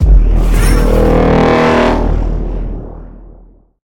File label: activate; smite; heimdallr